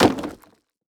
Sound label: Impact Plastic Dropped On Dirt